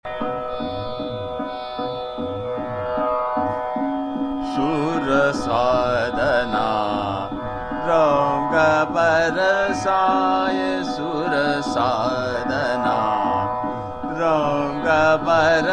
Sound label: Chota Khyal, Trital; Nut Bhairav; Sur Sadhna Rang Barsaye